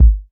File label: fluffy kick single shot G sharp key, Rich Mids Kick